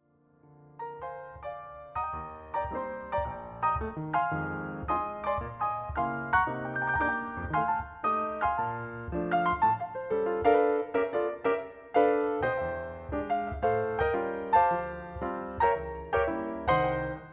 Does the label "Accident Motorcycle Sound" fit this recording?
No